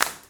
Clap Loose, One Shots Drums; Ableton Live 12 Lite; Core Library

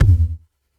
Tom; Ableton Live 12 Lite; Core Library; Drums

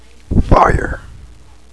fire; AngelTearsv3; AndromedaSky

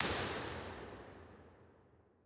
Real Places, Impulse Responses; Soulac Up L; Ableton Live 12 Lite; Hybrid

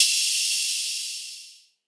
Ride; Drums; Ableton Live 12 Lite Core Library

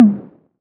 Tom 808; One Shots; Drums

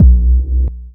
Kick; Ableton Live 12 Lite; Core Library; Drums

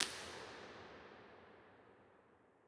Cathedral R; Bigger Spaces; Beauvais; Impulse Responses; Ableton Live 12 Lite

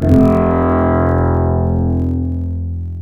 Instrument Sitar; Ableton Live 12 Lite; Vector; Sprites

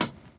Hihat Closed Vinyl

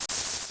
Destroy Bush; Open Zelda; Quest Designer 2.0.4b; Objects

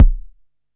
Kick; Ableton Live 12 Lite; Core Library; Drums

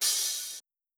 Crash Chop, Cymbal; One Shots; Drums; Ableton Live 12 Lite; Core Library

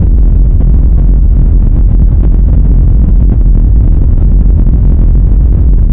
Brown Noise; Ableton Live 12 Lite; Vector; Sprites

